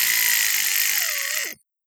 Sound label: whir down small